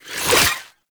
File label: Spore Pistol Reload; Weapons